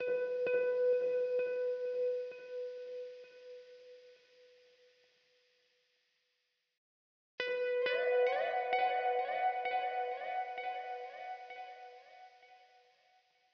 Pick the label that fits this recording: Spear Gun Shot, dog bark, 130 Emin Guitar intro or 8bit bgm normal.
130 Emin Guitar intro